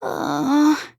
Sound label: uhhh; shirokuma